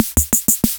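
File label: Kay R8 snare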